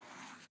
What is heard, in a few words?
Ink Sound Stroke